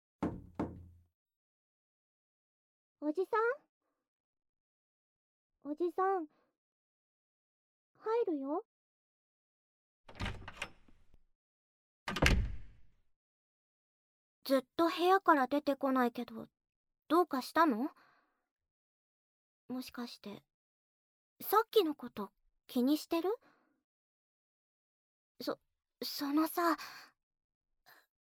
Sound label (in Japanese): 胸の鼓動を聞きながら、ささやき手コキ